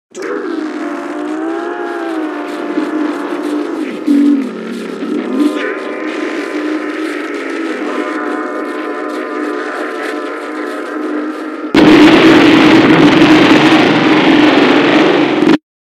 little guy head explodes

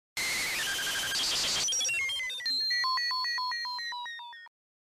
spell life; final fantasy vii

